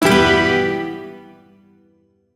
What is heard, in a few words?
Shawzin Maj Chord; Warframe; Duviri